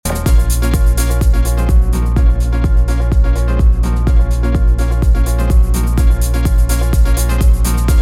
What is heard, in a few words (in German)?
Reloop RMX 10BT Höhen raus